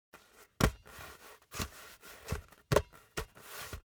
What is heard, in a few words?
hand touching a basketball